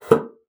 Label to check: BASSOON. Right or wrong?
Right